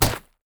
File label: minotaur basicatk; LoL; alistar